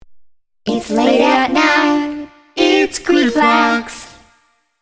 Jingle; Zyxx

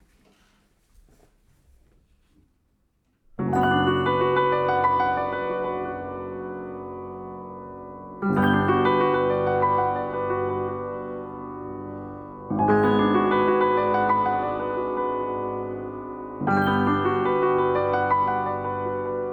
Piano Remake

